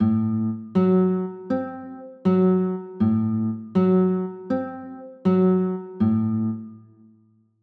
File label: a dim7, chords